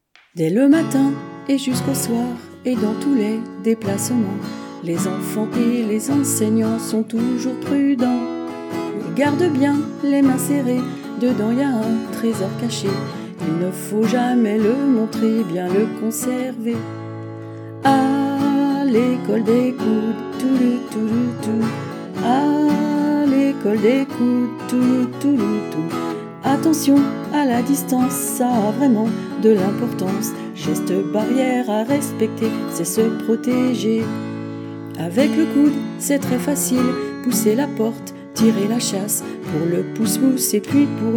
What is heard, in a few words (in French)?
guitare voix